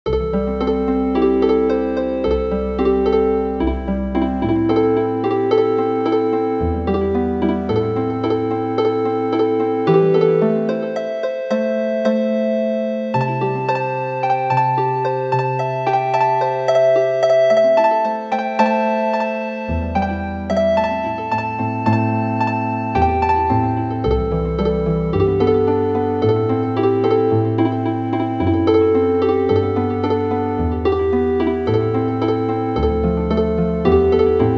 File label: Healin Harp